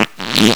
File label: fart